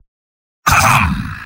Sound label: battle cry; spy; mvm